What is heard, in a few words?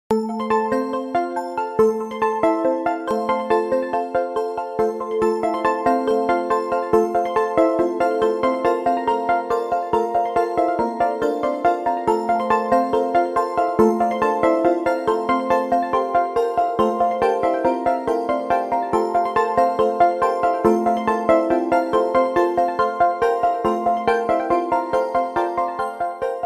oppo reno13, ringtone